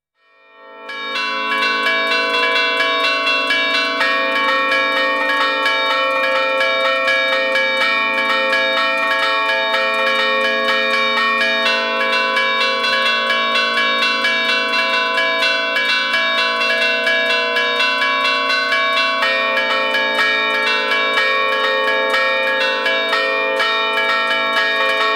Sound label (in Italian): campane